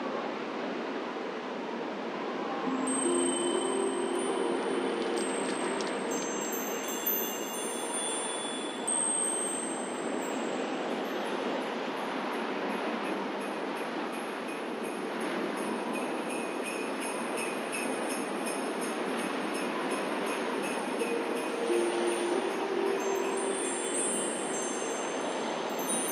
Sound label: Christmas Ambience; Angry Birds Seasons